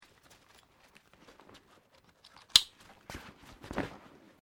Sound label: Backpack Nylon Small Strap Clip Close